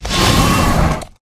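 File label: Dragon Skeleton dodge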